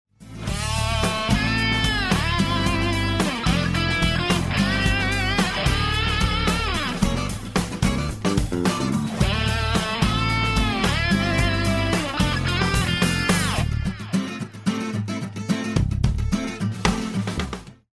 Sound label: start action; music kits custom